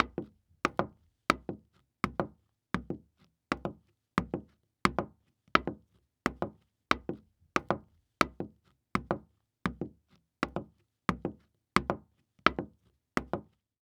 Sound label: Foley Footstep High Heels Walking On Hollow Wood Surface; Foley Footsteps